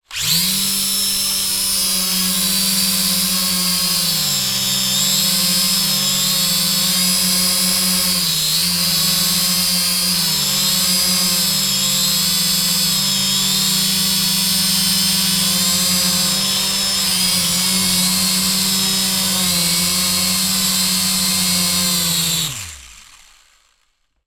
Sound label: leisure drone hover close syma x5sw